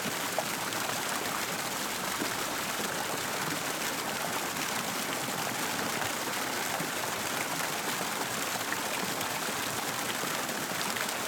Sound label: AMB, cabin, outdoor, fountain, 5 spouts, turbulent, tumultuous, at water level; Eiravaein Works; Vaeyan IV